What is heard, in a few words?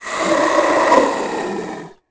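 scream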